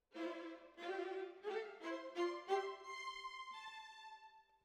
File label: Violas, jazz for piano